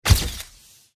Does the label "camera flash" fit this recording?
Yes